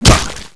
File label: claw strike